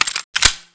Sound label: elite left clip in, weapons